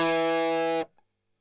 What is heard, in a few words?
Strum Line